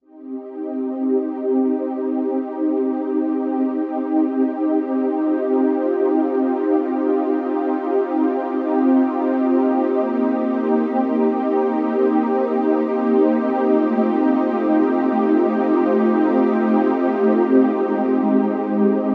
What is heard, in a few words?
Night Drive Pad, Novation UltraNova; Factory Patches